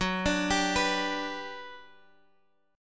G Major 7th, F sharp

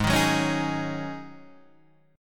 Ab 7th Suspended 4th Ab

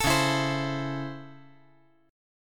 C sharp Minor Major 7th Sharp 5th C sharp, chord

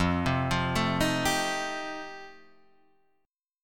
F 9th F, chord

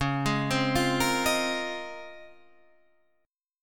Db Major 13th, Guitar Standard; arpeggiated; JGuitar

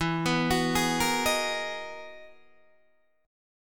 E-Major Sharp 11th E, Guitar DADGAD

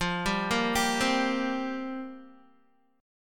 F Suspended 2nd Suspended 4th F down Guitar; Fsus2sus4; arpeggiated

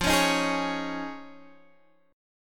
D sharp 7th Suspended 2nd Suspended 4th F, Guitar

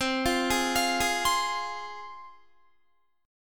C Suspended 4th Sharp 5th, C, down, Guitar; Csus4#5 arpeggiated; JGuitar

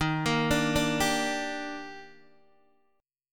Eb Major 7th, Guitar DADGAD

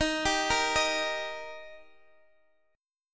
D sharp Major, Guitar, Open D; x,18,17,16,18,x; chordshape

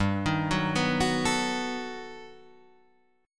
G Augmented Major 9th

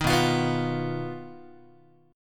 B Major Flat 5th B down Guitar; BMb5; strummed

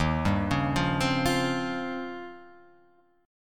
D sharp 13th D sharp

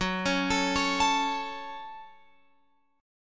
Gb Minor, Guitar, Open F; arpeggiated

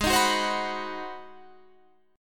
Ab 7th Sharp 9th down Guitar Standard; strummed; JGuitar

